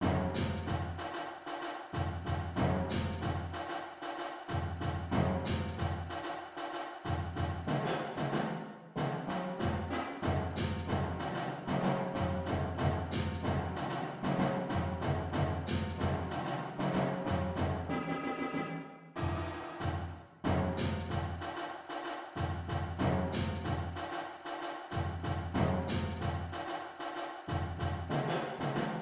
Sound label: 94 bpm